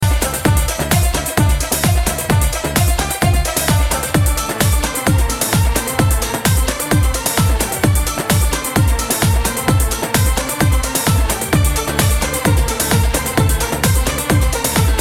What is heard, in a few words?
Bellydance; fan Veil